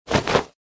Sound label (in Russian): Одел одежду; 30 Days to Survive